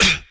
VOC DRUM, MOUTH; MOUTH PC

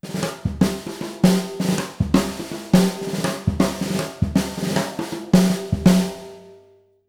160 BRKBT; Univers Sons; Jazzistic

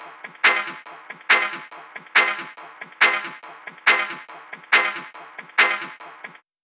GUITAR SKANK F

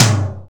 tom B4; Greytsounds; ADD-ONE KITS

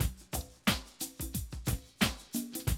Classic DrumLoops

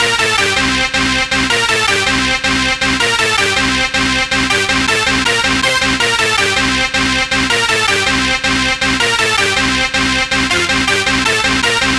Dance Synths, Hardcore Loops, Synth Loops

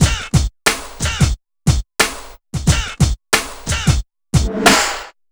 BPM 90; AKAI S-Series CD-ROM Sound Library VOL-4

